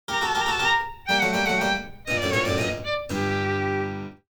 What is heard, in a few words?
Mobile game spooky mystery fail lose